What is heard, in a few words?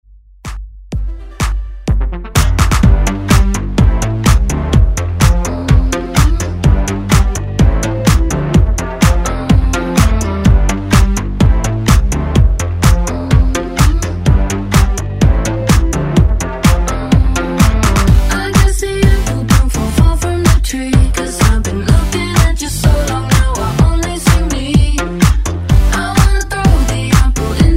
Dance Ext Redrum